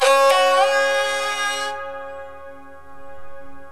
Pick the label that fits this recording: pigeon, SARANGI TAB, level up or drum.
SARANGI TAB